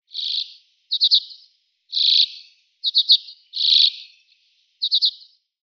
Polarbirkenzeisig Carduelis hornemanni

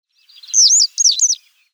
Zitronenstelze Motacilla citreola; Vogelstimmen

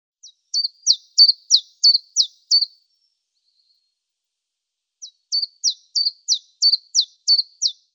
Zilpzalp Phylloscopus collybita; Vogelstimmen

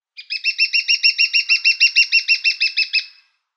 Kleinspecht Picoides minor; Vogelstimmen

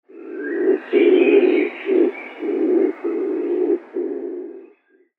Bolles Lorbeertaube Columba bollii; Vogelstimmen

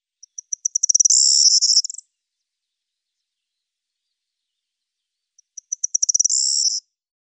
Grauammer Miliaria calandra; Vogelstimmen

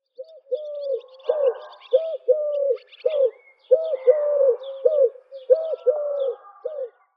Tuerkentaube Streptopelia decaocto, Vogelstimmen

